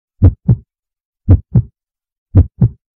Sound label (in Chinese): 心跳